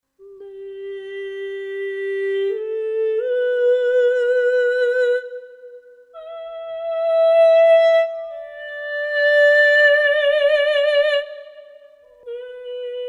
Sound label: Female Voce; Artinoise Recorder